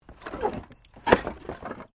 Wood Movement Minor; Material components for constructing more complex sounds; Materials

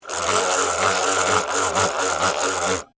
Foley, Handle, Metal, Device; Stutter, Stress; Hand Tools

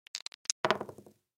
Dice Two Pick Up Drop Settle Felt Table; Casinos

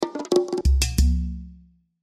Percussion Ethnic; Percussion Ethnic Fast Rhythm Accent; Hit; Blastwave

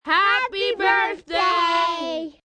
Kids Birthday, Cheering, group of children; VoiceBox

